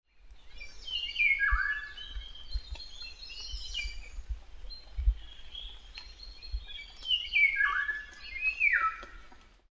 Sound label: golden oriole; grodek